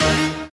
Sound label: super Meter Win Line; mega joker